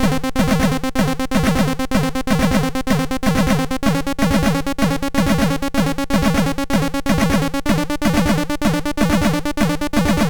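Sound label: Pac Mann, MOS 6581 SID chip music, games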